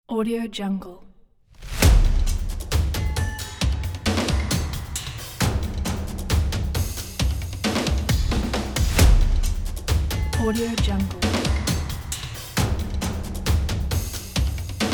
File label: action epic drum